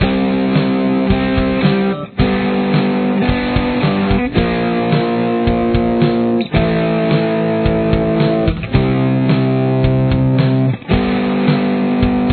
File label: chorus guitar